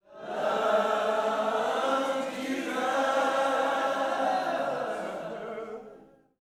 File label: LOVE JESUS, Vocal Planet Gospel; Spectrasonics; GOSP PHR